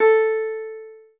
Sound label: spanish guitar